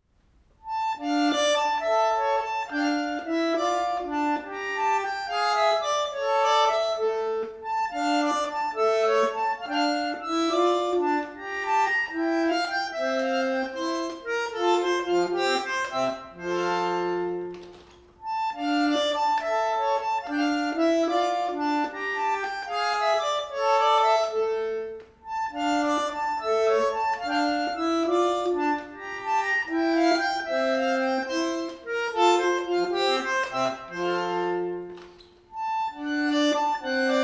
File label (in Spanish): Sonata RE menor Bandoneon